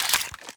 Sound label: clip out, desert eagle; weapons